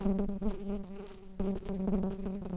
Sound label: Pascuorum workers, bumblebees